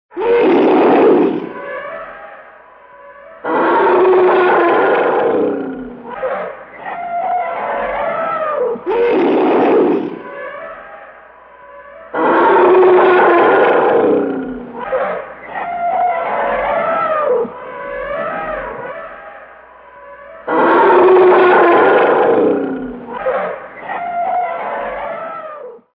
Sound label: elephant angry